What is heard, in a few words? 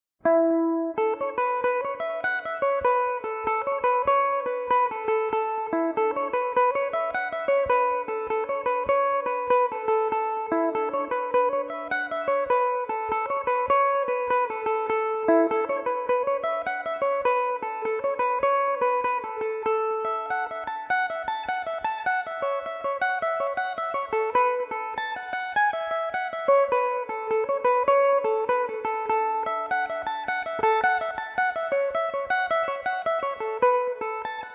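A major, irish mandolin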